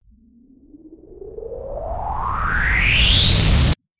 Long Riser

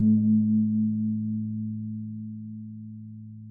gong

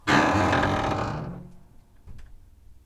Wooden Door Grincing With Reverb From Metal Basin

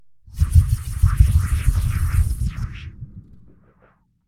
Twisting Whoosh

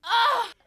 Screaming Woman Joy

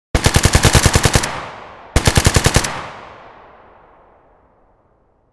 Machine Gun

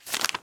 Paper Flip

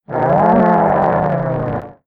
Growl

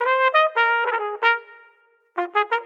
Solo Trumpet in F 90bpm

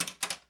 Click Clack Dishes Faucet

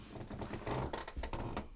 Chair Squeak

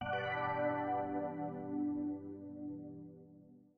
Soft Pad

